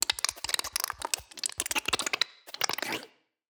Alien Tiny; Monster Sounds; TheDeclineOfWarriors; Monster Sounds Volume II

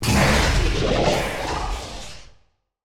Digital Creature Processed Chorus, monster sounds; The Decline Of Warriors; Monster Sounds Volume II

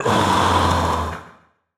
Zombie Agressive; The Decline Of Warriors; Monster Sounds Volume II

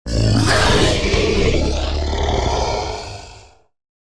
Digital Creature Robotic, Monster Sounds; TheDeclineOfWarriors; Monster Sounds Volume II

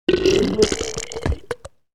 Zombie Disgusting Sounds, Monster Sounds; Monster Sounds Volume II

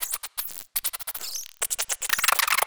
Tiny Creature Small, Monster Sounds; TheDeclineOfWarriors; Monster Sounds Volume II

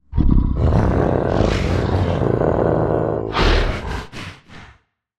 Canine Growling Close Mouth, Monster Sounds; TheDeclineOfWarriors; Monster Sounds Volume II

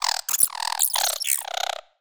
Tiny Creature Fast Stutter, Monster Sounds; TheDeclineOfWarriors; Monster Sounds Volume II

